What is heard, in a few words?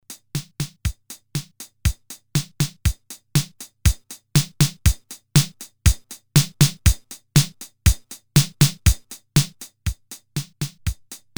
accent, roland cr1000; accent range